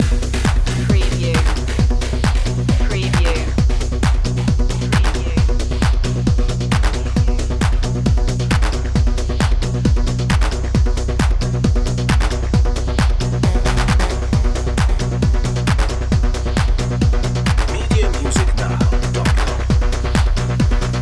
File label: nightfly loop